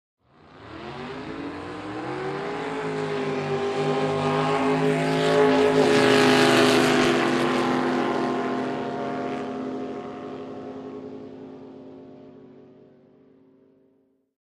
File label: Drag Boat Start by